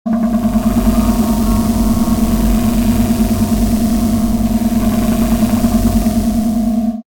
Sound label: Shield Active